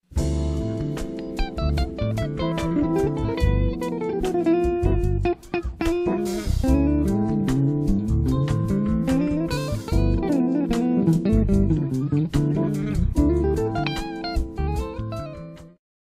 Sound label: jazz